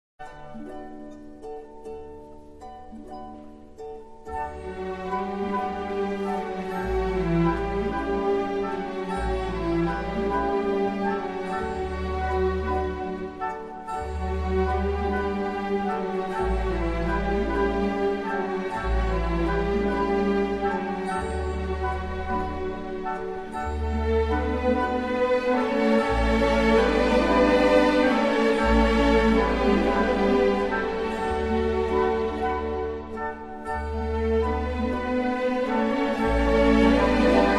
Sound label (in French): Barcarolle; Les contes d Hoffmann; Offenbach